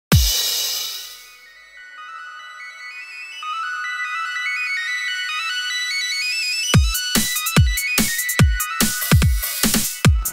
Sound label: electronic remix